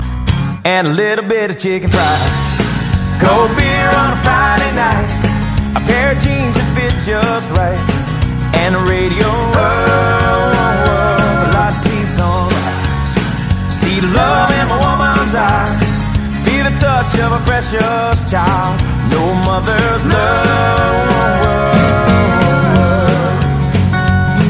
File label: funny song ringtone